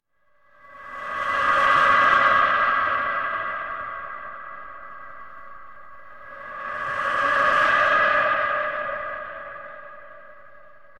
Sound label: horror stinger